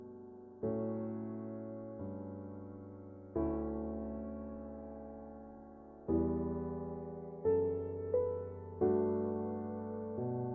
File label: Calm